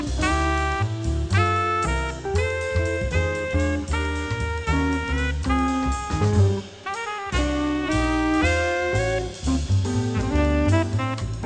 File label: jazz